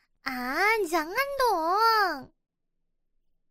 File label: ahh